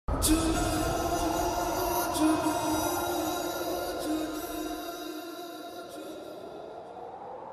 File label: echo